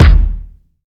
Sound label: large room kick drum sample d sharp key